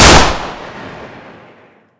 flaregun shot; weapons

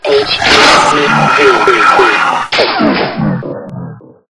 gonome melee; gonome hev

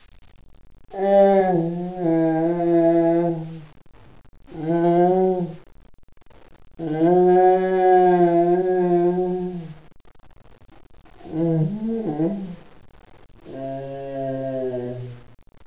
groans, ambience; caged

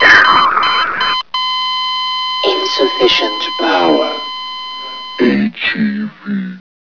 death; sven; zombie hev

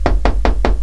knack window; aomdc

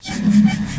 med charge; items

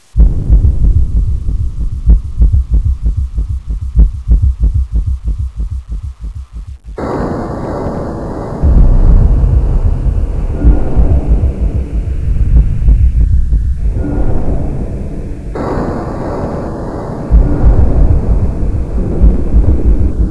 city; sven; aomdc

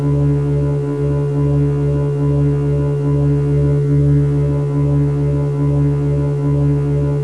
field, ambience; caged

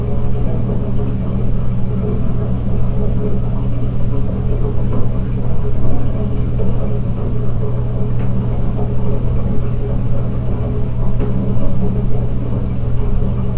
ambience; caged

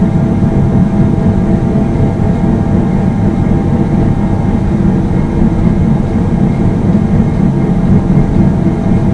ambience; caged

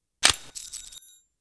flare pin pull; weapons

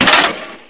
bust metal; debris; aomdc

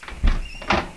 door move; aomdc

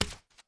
shock step; stepsounds

